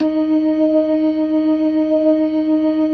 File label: LO MANUAL VIB, Organ